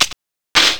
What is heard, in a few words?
dragon reload